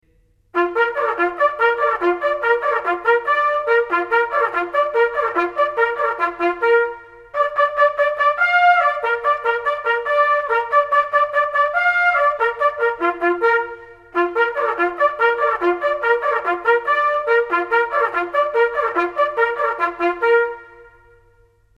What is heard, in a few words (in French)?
Reveil, sonneries; Le réveil; Sonneries reglementaires; BIGORS MARSOUINS